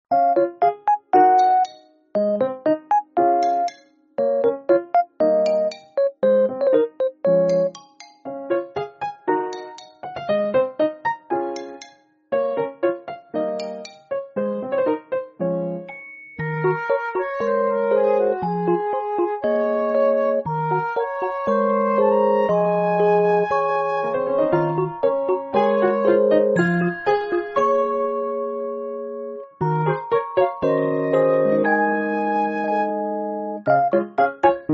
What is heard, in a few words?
music; dino